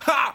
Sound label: ha, ooh ahh